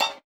BUZZER; Spectrasonics; Metamorphosis

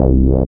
SYN 202, Roland MC-202

